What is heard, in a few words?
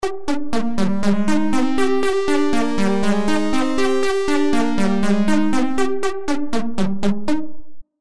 Strobe Space; Stepattern